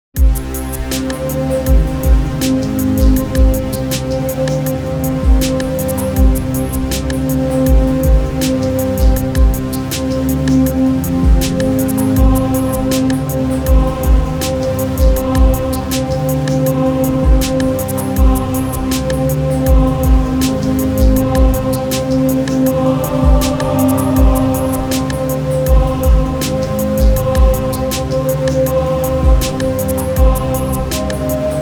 poseidon hiphop

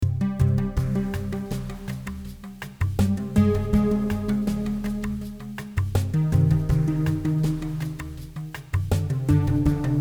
polar ice pluck dance

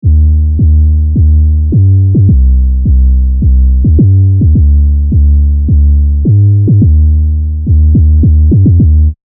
serum basses; chiqui audio

